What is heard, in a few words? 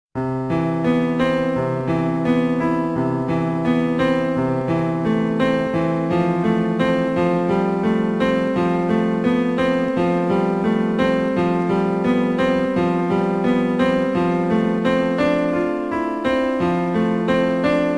National Anthem; Ceticilian